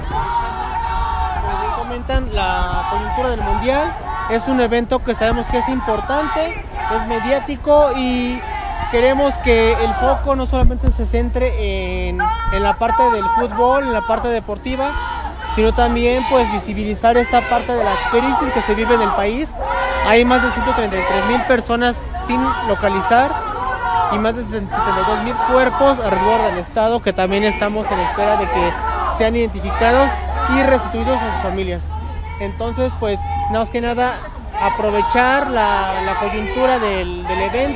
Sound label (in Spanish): Marcha familiares personas desaparecidas; AntiFIFA